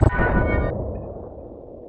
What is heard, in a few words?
portal open, portalgun; portalmod gun; weapons